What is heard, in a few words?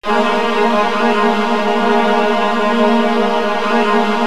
TRILLS, Virtuoso Strings; ILIO; Kirk Hunter Virtuoso Strings VOL-2